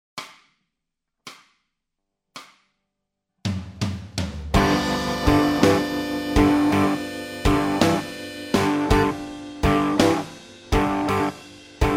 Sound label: bass blues, backing tracks